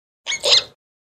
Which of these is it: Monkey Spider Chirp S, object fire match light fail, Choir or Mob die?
Monkey Spider Chirp S